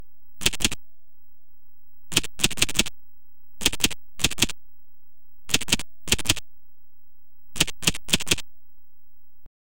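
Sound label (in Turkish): 4 basamaklı şifre girilme, keypad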